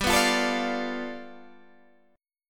G 9th, Guitar Open D

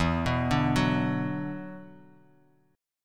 E 7th Suspended 2nd, down; E7sus2; arpeggiated

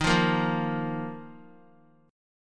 D sharp Suspended 2nd, Guitar; strummed; JGuitar

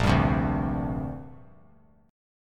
Bb 6th Add 9th Bb; Bb6add9 strummed

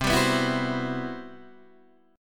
C-Major Sharp 11th, Guitar DADGAD

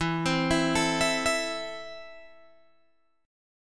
E Suspended 4th Sharp 5th, Guitar Open G; 14,17,14,14,17,14

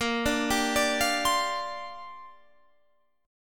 Bb 6th Add 9th Bb Guitar Standard; Bb6add9 arpeggiated

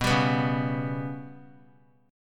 B Suspended 2nd Sharp 5th; Bsus2#5 strummed; JGuitar

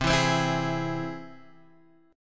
C Augmented, Guitar Drop D, chord

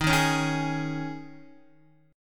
Eb 7th Suspended 4th, Guitar; Eb7sus4; strummed; x,6,3,6,3,4; JGuitar

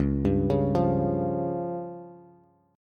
Db Major Flat 5th, Bass; arpeggiated; JGuitar

